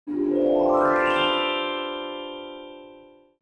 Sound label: Old vintage cartoon dream